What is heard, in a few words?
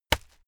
hit plastic fleshy hard